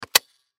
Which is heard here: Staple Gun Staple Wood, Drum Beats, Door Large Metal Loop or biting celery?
Staple Gun Staple Wood